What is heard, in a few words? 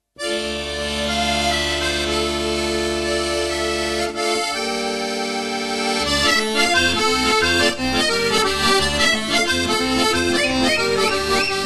Instrumental